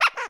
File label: Duck Push; Game rip; MiSide